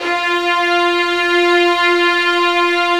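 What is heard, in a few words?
violin section, Arco Violins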